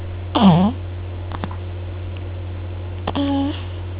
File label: grunt